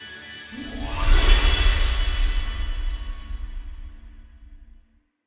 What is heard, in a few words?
medium Win Loop End; fairyhansel